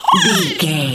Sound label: goblin fairy attack fast